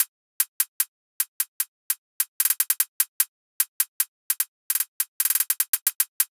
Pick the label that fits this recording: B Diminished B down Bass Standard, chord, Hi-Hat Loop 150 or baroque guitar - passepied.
Hi-Hat Loop 150